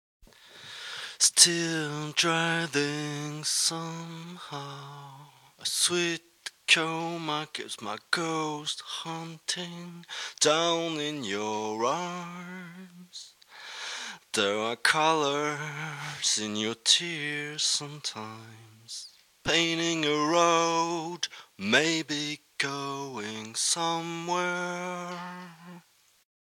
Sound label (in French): voix